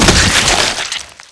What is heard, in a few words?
body splat; svencoop; echoes